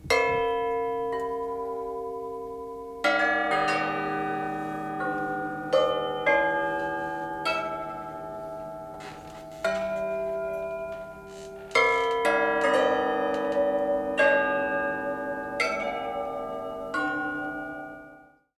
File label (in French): cloches